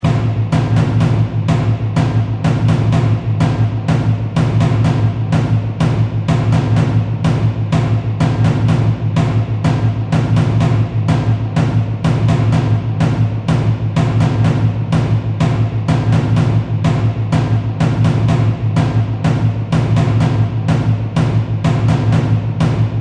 tension Loop; Dynamite Digger Eureka

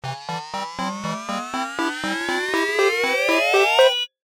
nudge anticipation; Step Back 7's; nudges

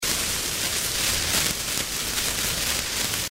dynamite sticks Loop; Dynamite Digger Eureka

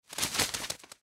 tookie fly Away; tiki-beats; eyecon

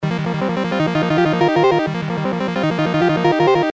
rollup long Loop; Fluffy Favourites Megaways

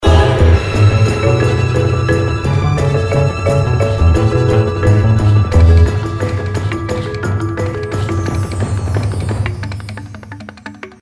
feature music; tiki-beats; eyecon

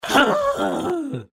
scream; Bouncy Balls 2; green

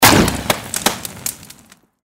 torch Light; tiki-beats; eyecon

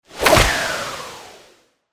super win paper; Hot Off The Press

